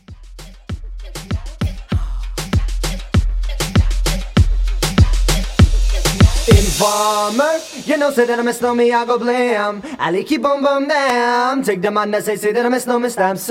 90s Moombahton Mixshow Mix 98

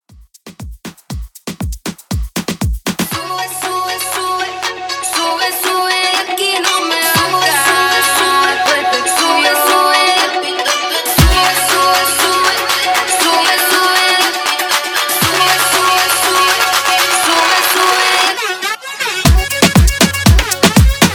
Moombahton, 119 BPM

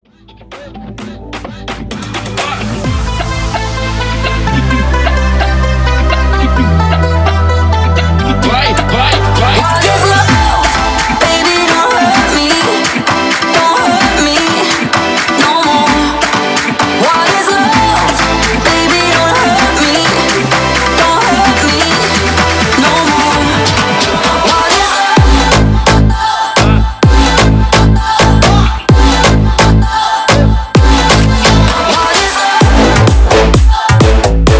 129 BPM; Quic Cutz Bootleg VIP

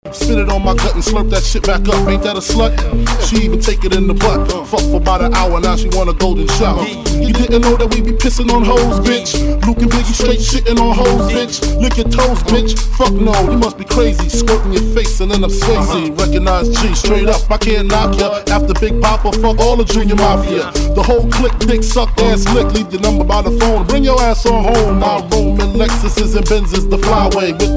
Classic Hip Hop; MashUp

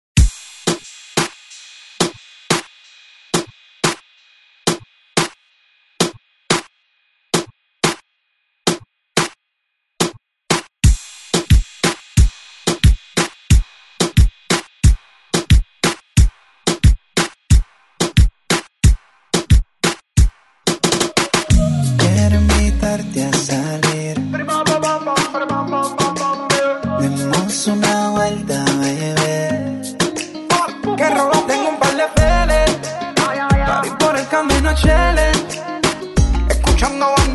ReDrum; DJ Mhark

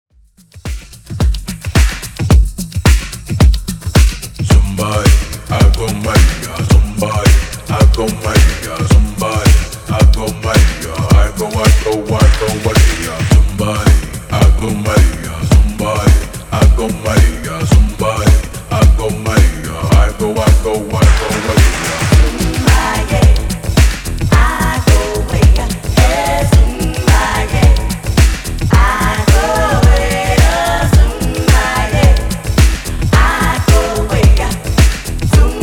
90s Redrum 109 Bpm

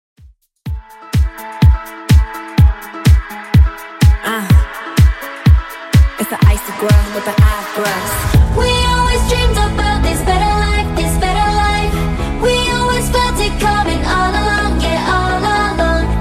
Top40 Redrum 125bpm

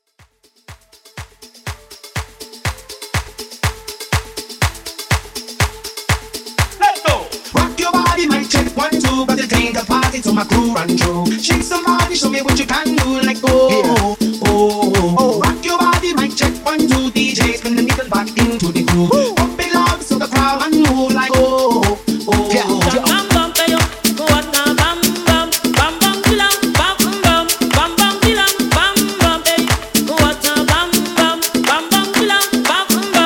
Jamaican Party Intro 122Bpm; remixplanet

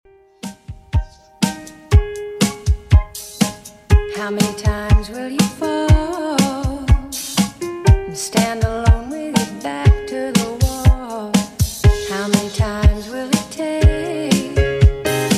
80s Redrum 121bpm

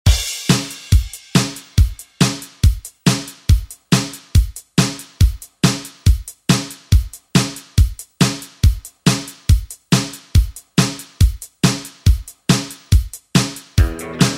70s Re Drum; DJ Mhark; remixplanet

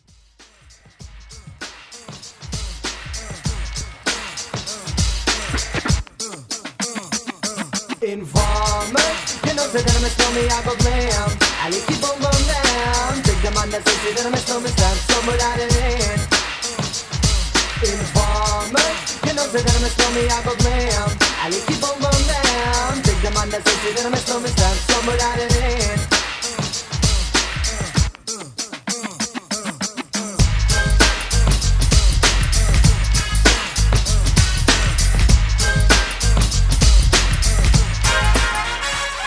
Old School Redrum; remixplanet

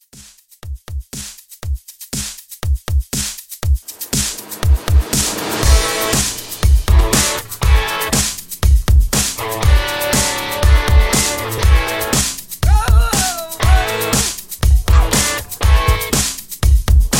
70s Hard Rock Re Drum 120

